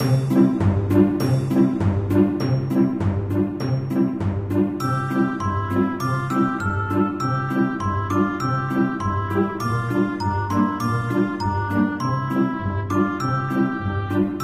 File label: Town; MapleShrine; mkxp; gameasync